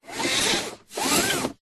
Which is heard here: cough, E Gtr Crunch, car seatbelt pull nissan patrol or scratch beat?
car seatbelt pull nissan patrol